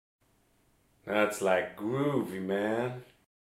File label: Disco